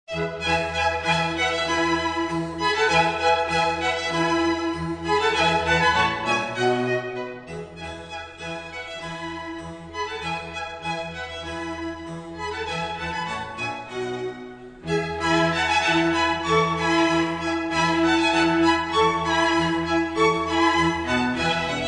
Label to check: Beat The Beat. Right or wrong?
Right